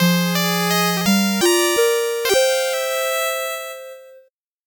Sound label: 8bit jingle fast